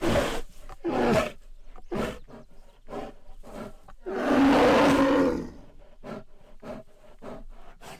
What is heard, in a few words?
bear idle